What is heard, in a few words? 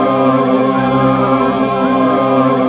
Pseudo Vocadrone; Sound FX